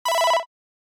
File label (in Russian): originalnye ringtony i uvedomleniia samsung galaxy s22 s22+